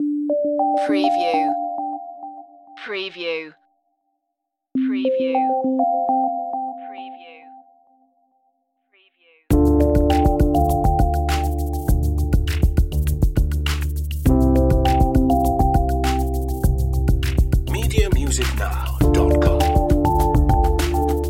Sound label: alt bass